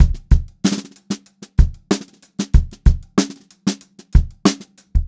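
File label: STRAIGHT BEAT, Funk-Groove Drumloops; USB Soundscan vol.38; AKAI; 90 s Sample CDs; 94 STRGHT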